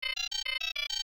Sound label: startup; webtv